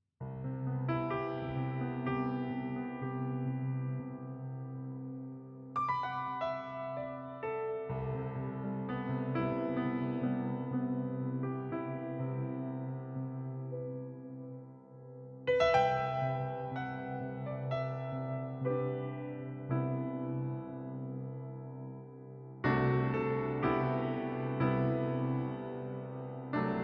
Smooth Jazz Band Relaxing Piano Music

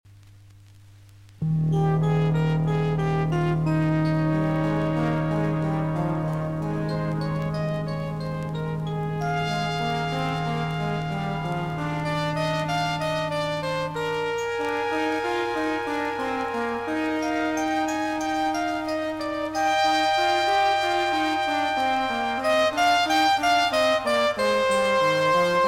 Prelude and Fugue in E-Flat Major, Clavier; Well-Tempered Clavier

